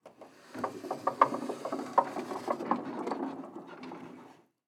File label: Sliding Door Wood; Sliding Bookcase; HauntedBloodlines; SFX Producer